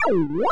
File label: Retro Super Jump